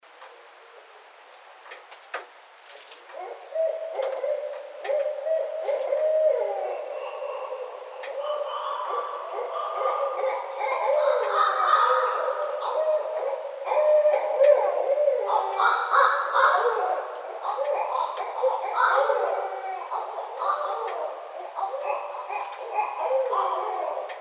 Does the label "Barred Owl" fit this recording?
Yes